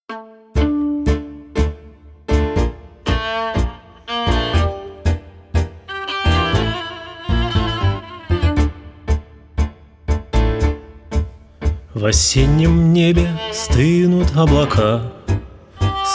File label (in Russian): Sentimentalnoe Tango